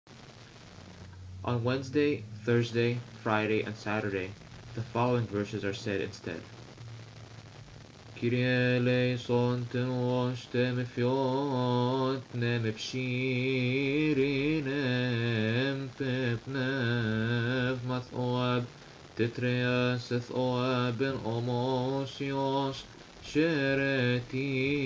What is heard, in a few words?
Verses Of Cymbals; Annual Hymns Congregational Replies